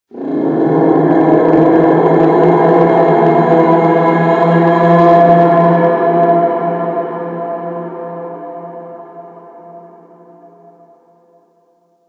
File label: Ambience Hub Red Veil; Warframe; Tenno